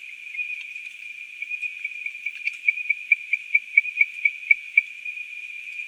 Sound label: Pristimantis pleurostriatus